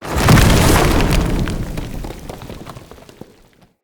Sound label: Stone Boulder Break